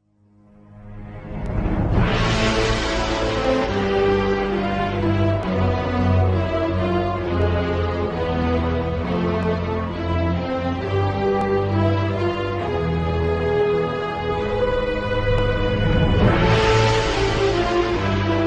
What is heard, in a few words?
ringtone; iphone 6 plus